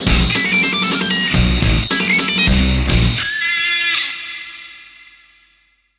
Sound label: Devil Jingle; captain rainbow; wii